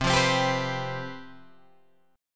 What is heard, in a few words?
C Major 7th Suspended 4th, down, Guitar, Open G